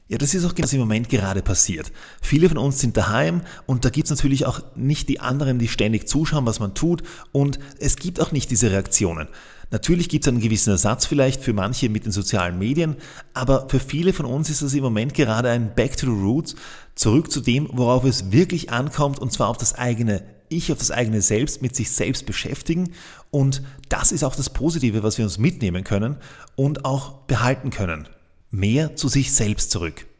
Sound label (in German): applaus